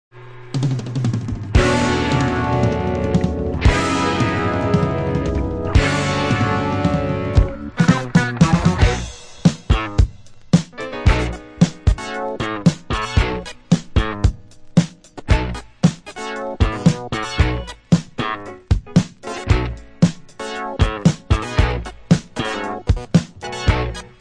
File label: JAPANESE SYNTHS funk music